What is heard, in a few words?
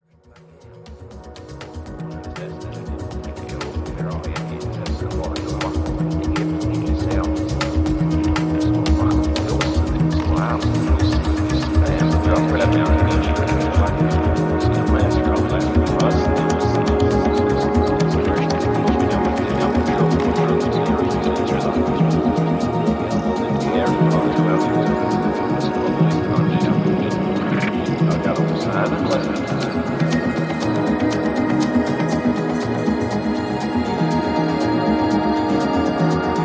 Oceanic Trance